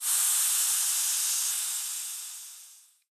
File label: leak; outfly